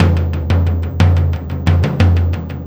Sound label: Tom Particle, Processed Drumkits; Zero Gravity Beats; Particles